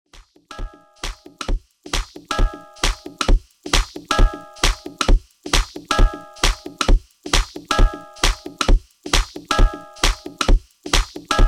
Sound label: Loop